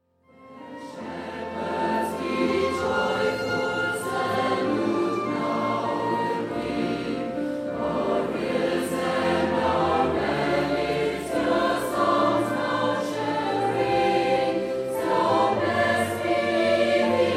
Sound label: Celtic carol